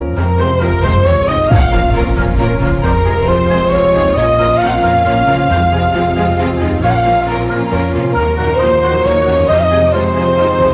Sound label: Title Theme BGM Ringtone; Dhanush; Thiruchitrambalam